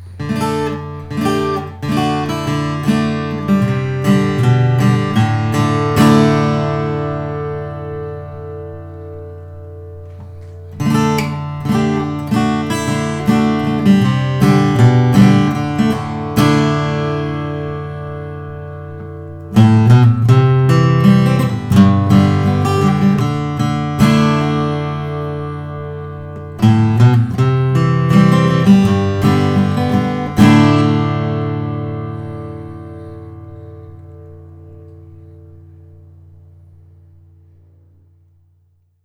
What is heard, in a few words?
martin custom 00 42sc; natural